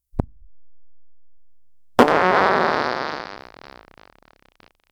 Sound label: ultra short one shot fart